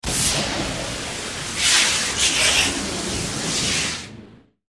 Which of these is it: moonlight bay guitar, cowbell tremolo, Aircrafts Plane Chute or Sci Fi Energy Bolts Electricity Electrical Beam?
Sci Fi Energy Bolts Electricity Electrical Beam